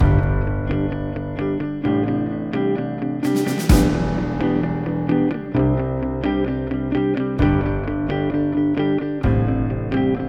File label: Lift Up Guitar Simple